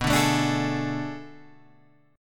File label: B Minor 13th B down Guitar Open G